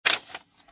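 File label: Character subnav button; disney; cars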